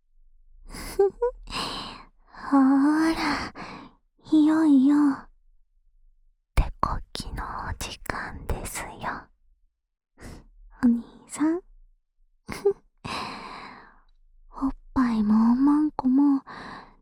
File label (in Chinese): 舔耳手交 右, ASMR